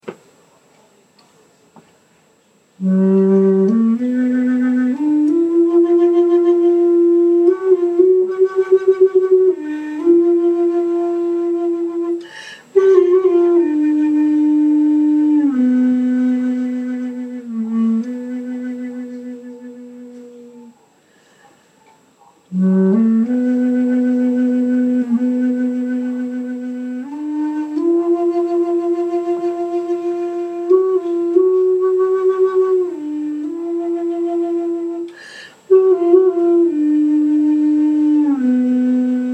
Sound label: LOW G Carved, flutes; birch